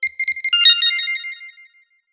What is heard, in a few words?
Sun shine alert; Sony Ericsson; C901; PlusFourSix; Paul Bergman